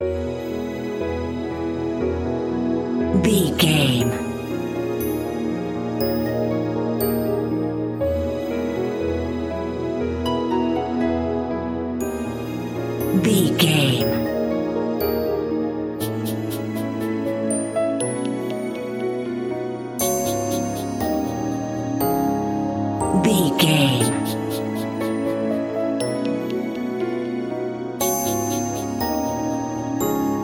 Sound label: land fairy tales narrative